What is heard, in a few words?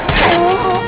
laser gun